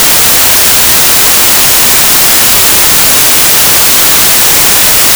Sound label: annoying Noise